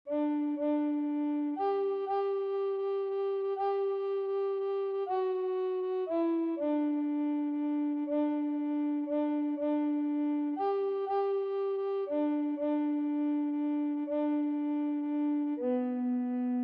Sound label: Tenor Recorder; Earl of Essex Measure